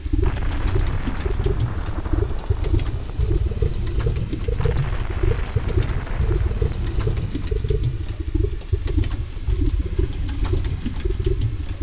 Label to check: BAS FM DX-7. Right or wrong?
Wrong